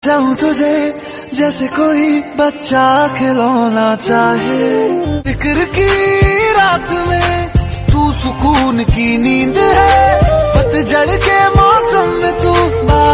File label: Love Song Ringtone